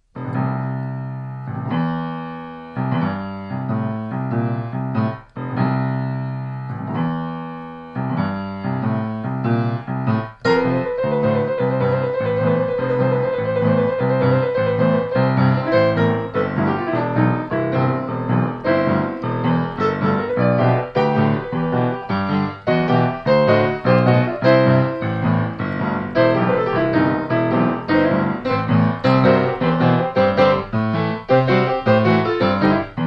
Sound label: Hobo Stomp, Piano; piano kurs